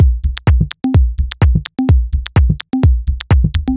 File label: Glossy C 127bpm, House Drumloops; 90 s Sample CDs; Zero-G House Fabrik Drumloops; ZG Drumloops